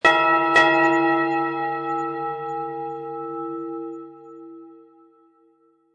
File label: church bell